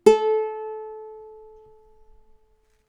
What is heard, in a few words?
uke